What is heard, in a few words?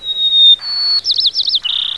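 white crowned sparrow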